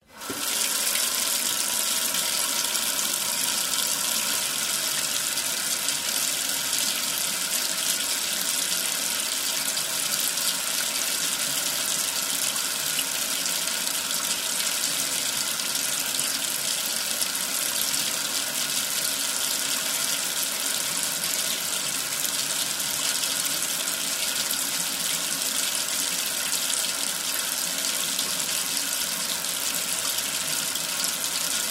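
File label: water flowing from tap into metal sink; umivalnik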